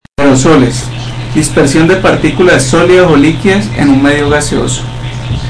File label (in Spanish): Aerosoles